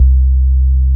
bass, 200 BPM, Drum & Bass; Ueberschall; Drum & Bass Resonance

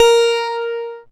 A#, PIANO; Big Fish Audio; Synclav FM

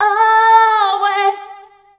VOC F, Dance Vocals, FEMALE; Best Service ProSamples vol.12; AKAI

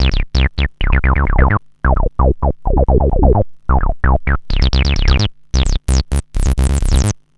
Analoop, Industrial

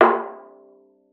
VIOLA FINGER; Xsample VOL-5

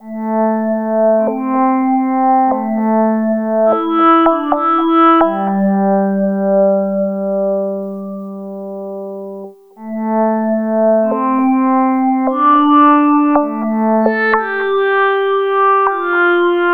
Backwards Talking Guitars, Electro Lounge; Sonic Foundry (Sony Creative Software); 098 Savvy

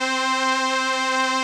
SOLINA, String Machines

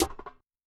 RUNNER; Yamaha DTXpress-II

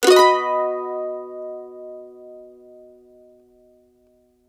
CEE CHORDS B, Blues Guitar Samples

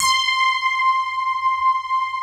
MUON PADS; Pro-Rec - Synthsonic

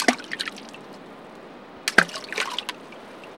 sfx, FX Atmos

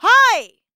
VOICE, EASTERN FX; ILIO; Vocal Planet

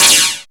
Perc FX, Percussion; Dance Synths

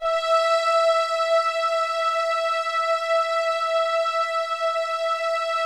E4, Keypad; Standard Instr; Keypad 3

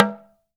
TALK DRM, Heart of Africa; Spectrasonics

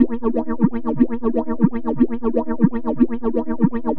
LFO, Noise

